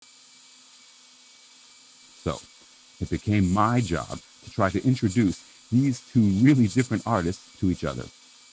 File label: drill